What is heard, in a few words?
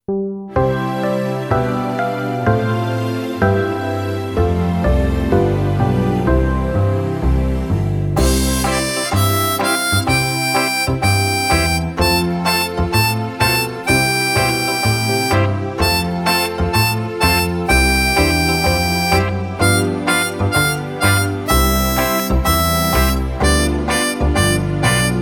Harmonica All my ducklings to listen to, blues harmonica